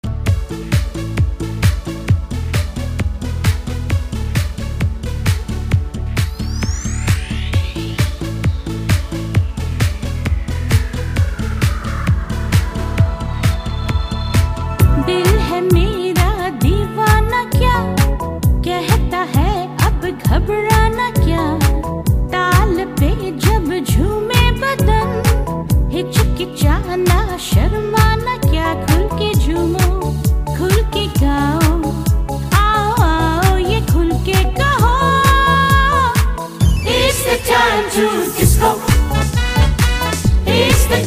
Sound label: disco